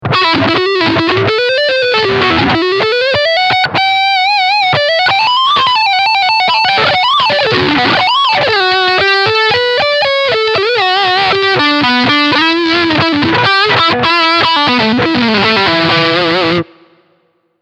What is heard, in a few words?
Leadsound Front Humbucker